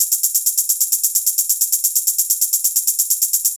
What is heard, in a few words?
Drum Loops